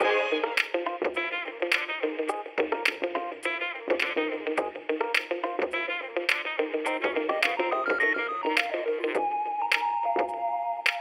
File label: Music Railyard Loop